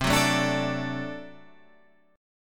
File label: D Major C, guitar